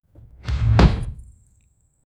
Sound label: a magical chest opening, treasure